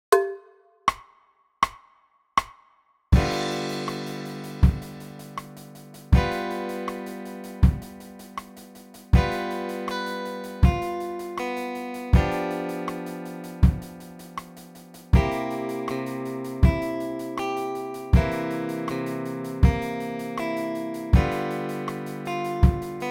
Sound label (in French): Blues Simple Sol, basse electrique